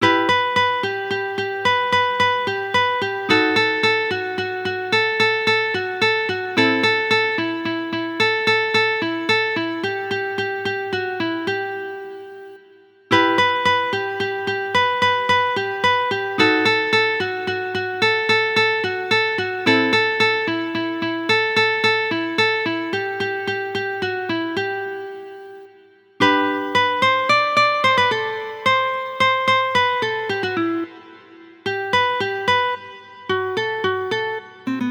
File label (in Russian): vstuplenie